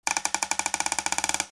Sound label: Squeaking wood opening of a box